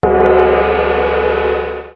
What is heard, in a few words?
gong